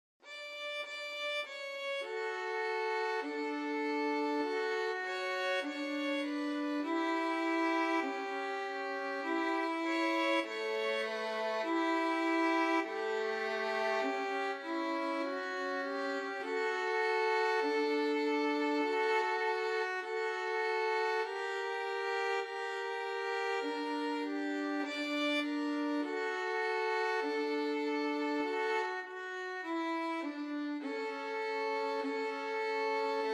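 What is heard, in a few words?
o sole mio violins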